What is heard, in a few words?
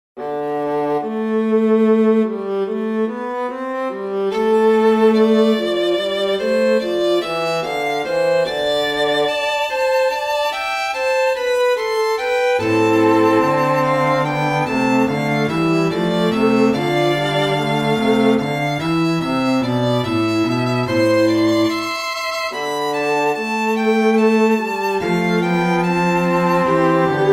string quartet; aubert francois; tran minh